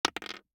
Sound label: DICE on Hard Wood, Throw and Roll, Standard, 1 One Die; Samples; ArticulatedSounds; DICE Sfx Party (Extended)